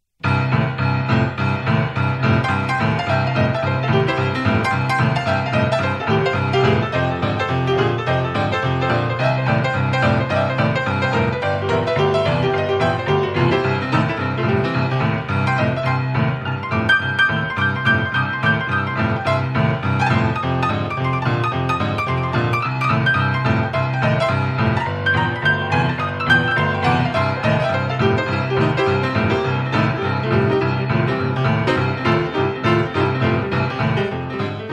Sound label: Quarter Bass Boogie